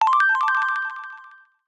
notifier trickle up; Mozilla; Boot2Gecko

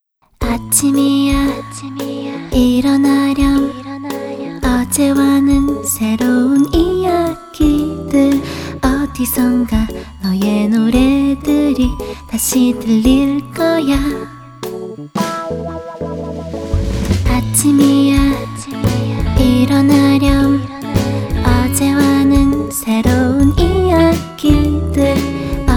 Morning Song, phonetones music; LG; Optimus LTE3

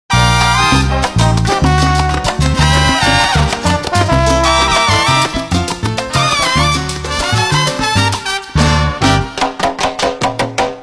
Solo, Nokia Asha 300 Ringtones

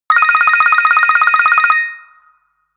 Wood bell, phonetones, Nokia; Nokia E7

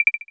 Clear, Samsung SGH-i600

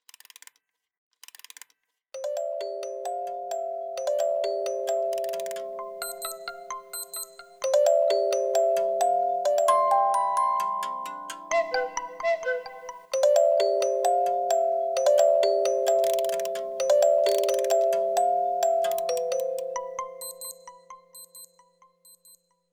Clock alert

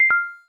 cam stop

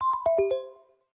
Folder Close; LG Folder Y110S

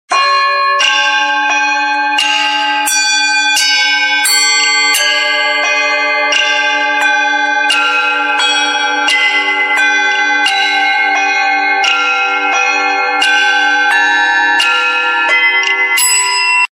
TIBETAN CARILLON; Samsung; GT-B7620 Giorgio Armani

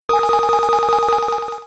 Ring Standard; Samsung SGH-i617 BlackJack II

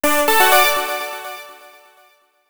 True alert; Sony Ericsson; PlusFourSix; SE Sound Project; Lars Edvall

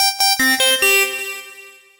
Alien alert; PlusFourSix

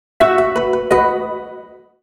sms; Walton; NEXG-N70

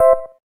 gayageum, keytone

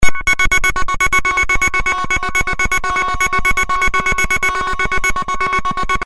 Alert, Alcatel OT-660

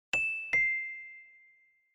Doorbell

